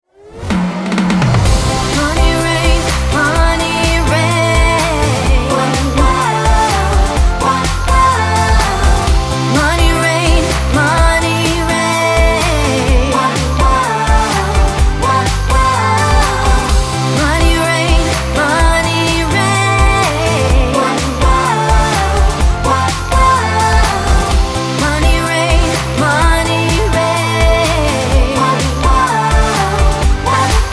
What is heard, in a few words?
Pop/EDM